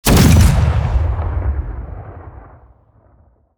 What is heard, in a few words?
fire dist, rocket